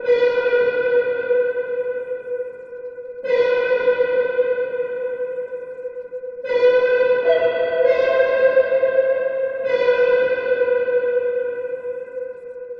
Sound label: Synth Pad Am 150, Trap Loops; Melody Kits; Ghosthack; HipHop